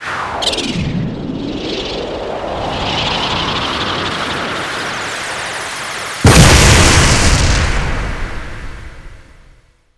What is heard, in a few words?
Boss Combine; Pac-Man Championship Edition 2 Plus